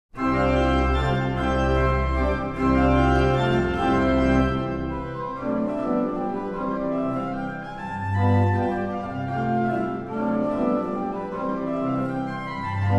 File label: chopin mazurka op 68 n 1